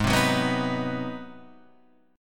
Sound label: G sharp 7th Sharp 9th G sharp, down, Guitar, Open F; G#7#9; strummed; JGuitar